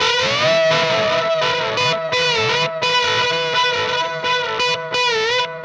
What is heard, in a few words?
Static FX Guitar